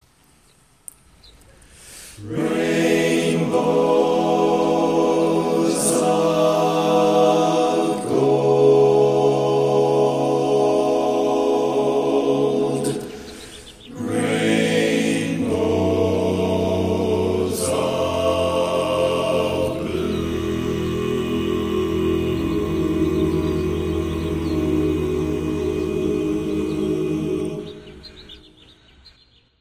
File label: Bass, barbershop tags